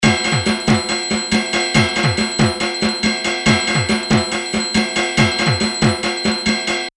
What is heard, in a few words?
dhaak